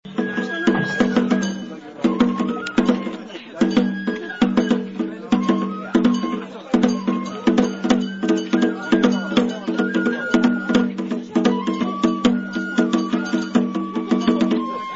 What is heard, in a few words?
banjo